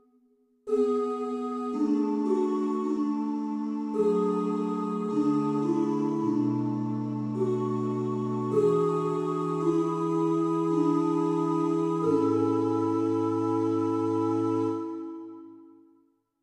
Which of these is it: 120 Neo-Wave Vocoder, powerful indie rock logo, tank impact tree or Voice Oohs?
Voice Oohs